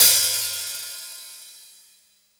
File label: Hi Hat Open